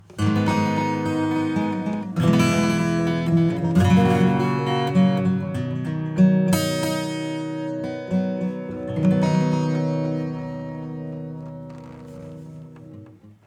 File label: acoustic default; GEM Echoson